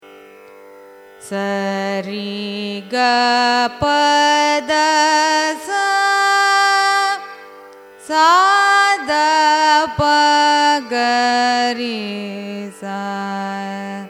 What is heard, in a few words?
raga revagupti arohanam avarohanam; carnatic music